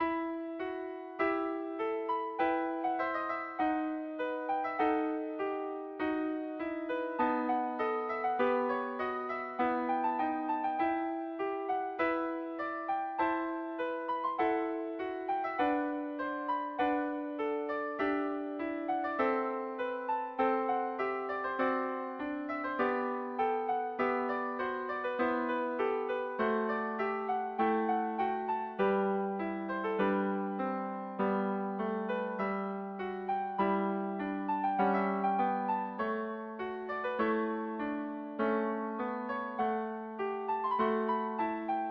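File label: vivaldi concerto movement 2, piano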